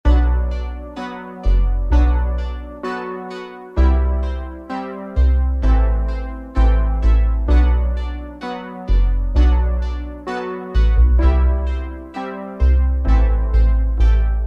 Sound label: Instrumental; 90210